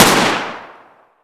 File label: jam shoot; weapons